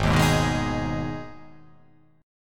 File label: G sharp Minor G sharp down, chord